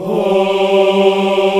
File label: AFRO AH G 3, choir ahs; Spectrasonics; Heart of Africa